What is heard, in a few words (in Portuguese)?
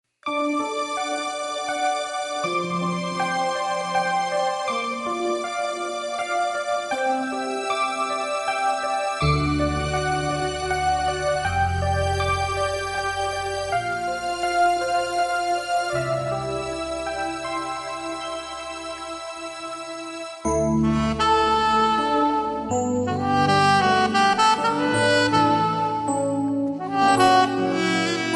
sax; sax alto